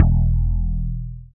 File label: BAS FM DX-7